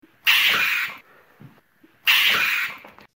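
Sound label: mink scream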